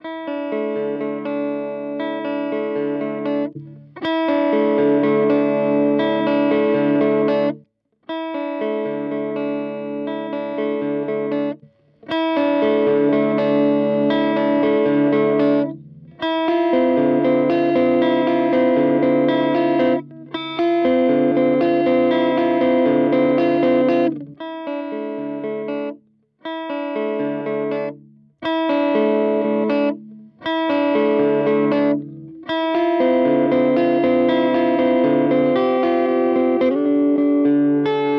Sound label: Compadre Fender Squeeze Kompressor